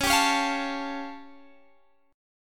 A Augmented 7th Db

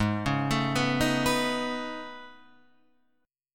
A Major Sharp 11th, Ab

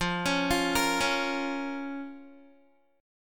F Suspended 4th Sharp 5th F, Guitar; Fsus4#5; arpeggiated

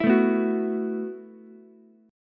E Suspended 2nd Suspended 4th E, Ukulele; strummed; JGuitar

